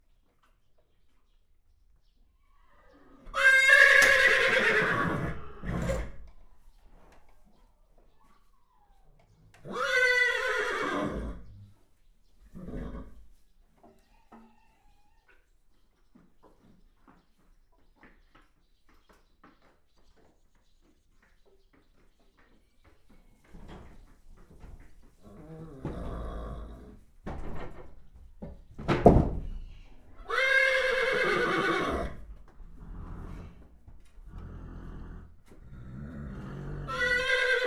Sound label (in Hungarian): ló istálló; parasztudvar